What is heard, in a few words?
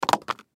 Book Drop Large; Book Drop Cardboards; Books; Foley; Offices; Blastwave